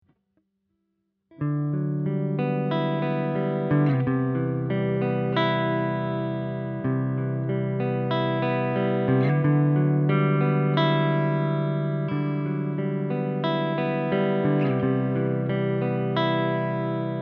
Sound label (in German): Katana clean neutraler EQ